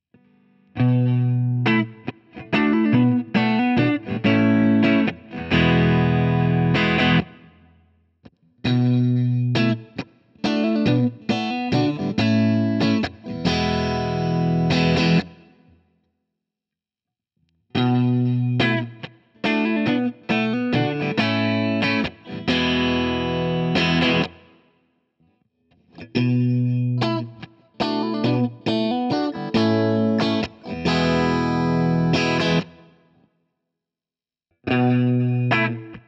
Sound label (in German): Fender PP Nashville Tele PU Positionen Clean